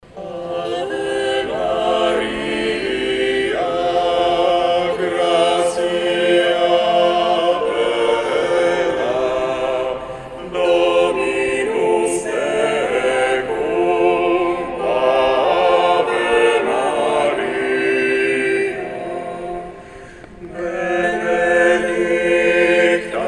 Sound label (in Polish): chor; pielgrzymka muzykow